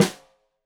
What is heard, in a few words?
Snare Stereo, Drums; Deeply Sampled Drums